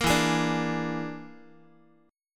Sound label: E 7th Flat 5th, down, Guitar; E7b5; strummed; 13,12,11,12,x,x